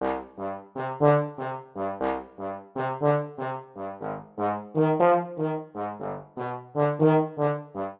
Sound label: Ostinato Bounce i VI V C Minor 120 bpm, Brass Quartet; MIDI Clips; Ableton; Spitfire Audio